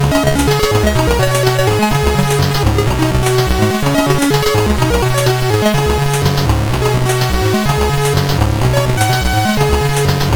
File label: Zombies, sid CSG8580R5